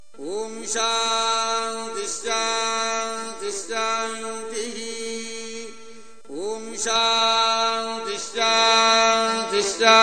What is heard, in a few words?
Om Shanti Mantra